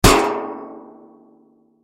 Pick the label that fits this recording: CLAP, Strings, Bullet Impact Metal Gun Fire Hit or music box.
Bullet Impact Metal Gun Fire Hit